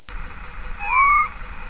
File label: american redstart